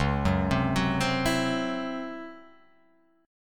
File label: C sharp Minor Major 7th Double Flat 5th; C#mM7bb5; arpeggiated; JGuitar